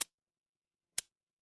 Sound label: office pen